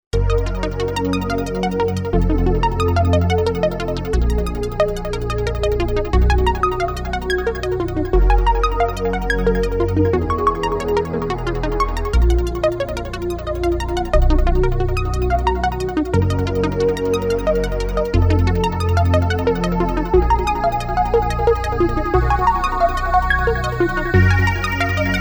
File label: Stereo Sequenz; Spectralis